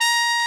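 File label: trumpet, cheese trumpet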